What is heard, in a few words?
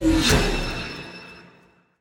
Skill Magic; portal quest; android